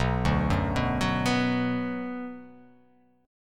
B-Minor

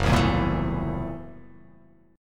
F sharp 7th Sharp 9th Flat 5th F sharp down; strummed; JGuitar

